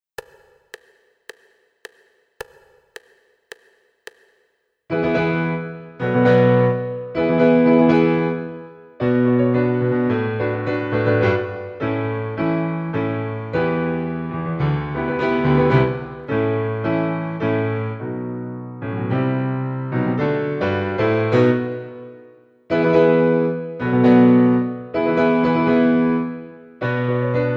Violin Acc.

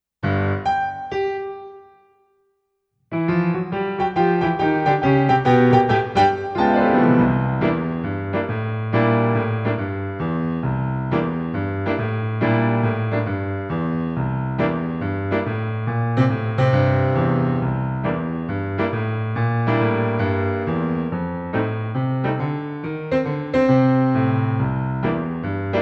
Music Camp Boogie Violin Acc.

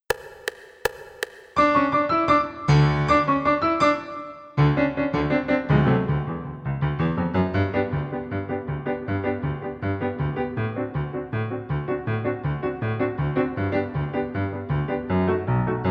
Polka Violin

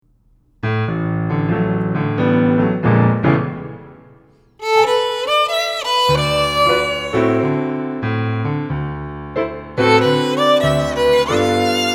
Sky Blue Violin

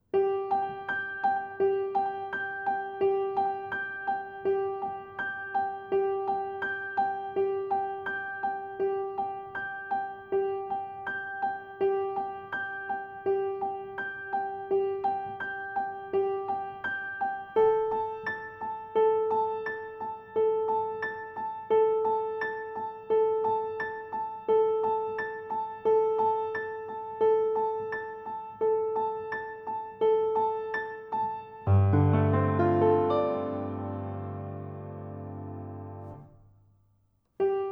Reach for the Stars Cello Acc

